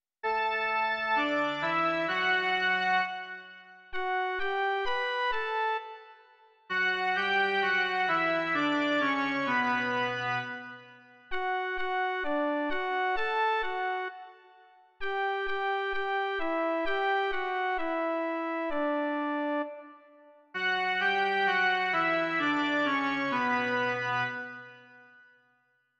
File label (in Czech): jen melodie, Doprovody liturgie; Vzhůru srdce; Doprovody Farského liturgie